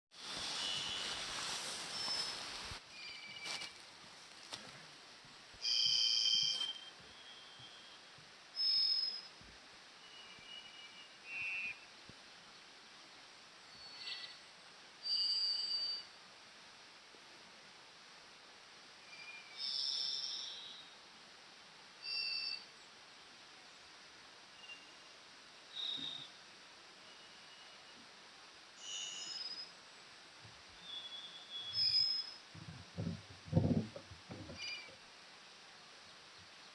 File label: birds